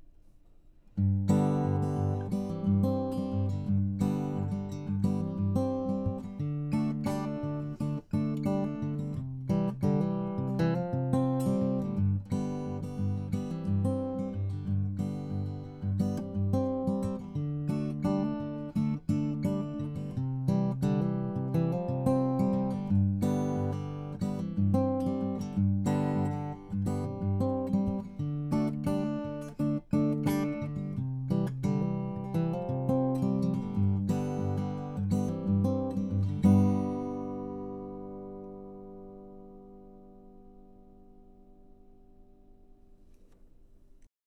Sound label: Fingerstyle Microphone